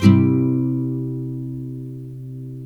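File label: NYLON, C MAJOR UP, CHORDS; Spectrasonics; Hans Zimmer Guitars VOL-1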